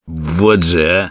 negative vocalization; Sniper

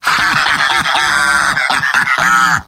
Heavy laugh happy

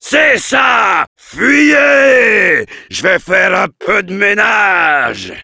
yell, fr; Heavy

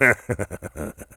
laugh evil; Engineer; fr

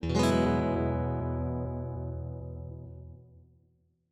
Taunt strum; eng

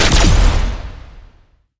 Shooting star shoot

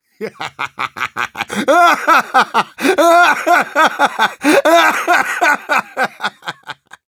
laugh long; Engineer

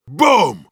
meleeing, ru; Heavy

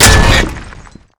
charge hit flesh

